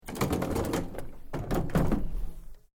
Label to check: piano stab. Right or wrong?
Wrong